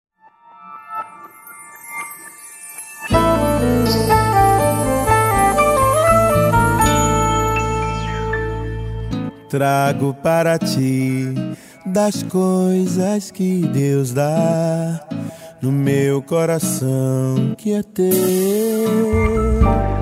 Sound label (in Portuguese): Romantico Ambiente